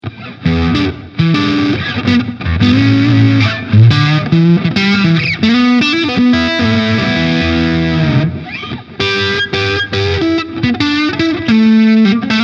Hals verzerrt

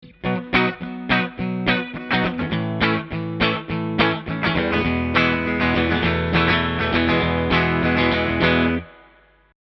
EGit Clean; Nohype LRM V; RME UFX 26db

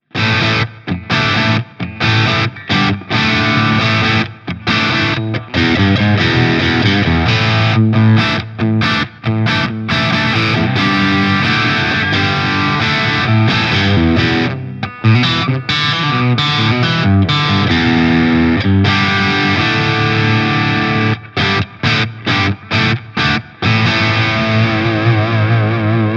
Hals verzerrt; GL Tribute Doheny Shell Pink

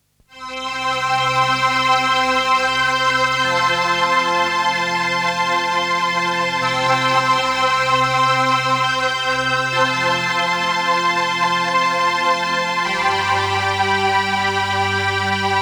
Opus 3 String mit HP Filter

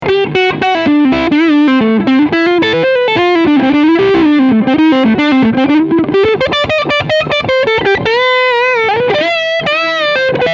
beide Crunch

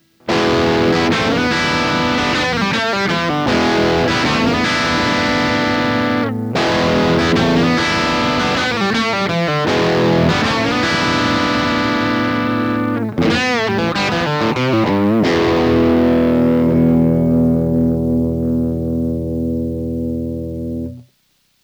Orange Gitarren Effektpedale Distortion Low Depth

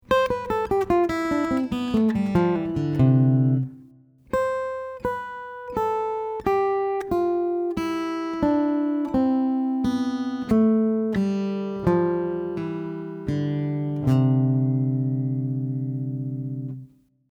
C Dur akk.

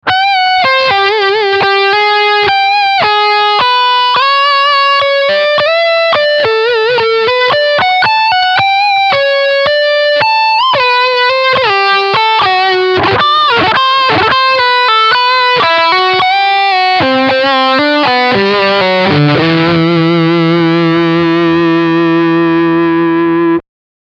Leadline Mitte und Steg PU

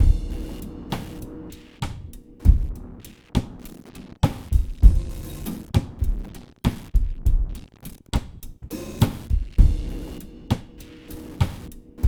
Stepsequenzer Bitreduction Subtle

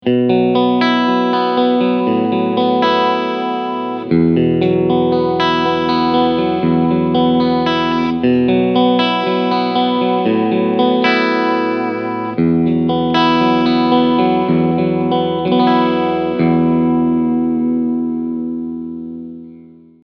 Clean Steg SC Mitte SC

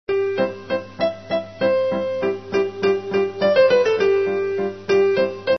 Original Forelle

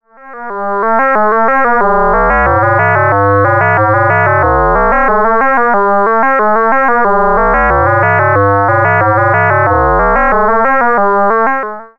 E-RM Polygogo LFO Dreieick mod Roll

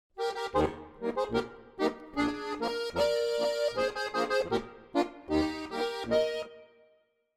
Steirische Harmonika

